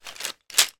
office stationery pens etc in large pencil case down on table